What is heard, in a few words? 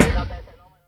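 Industrial hihat; Yamaha DTXpress; Effect 1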